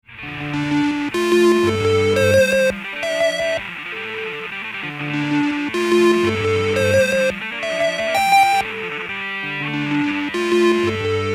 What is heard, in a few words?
Loop And Solo